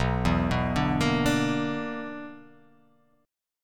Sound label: B-Minor Major 7th Double Flat 5th